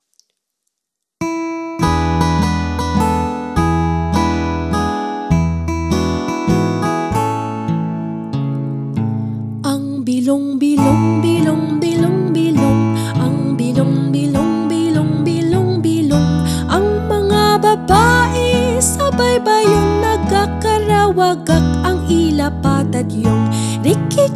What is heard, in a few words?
Ang Bilong bilong with accompaniment